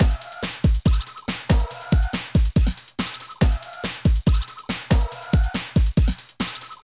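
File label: drum loops, right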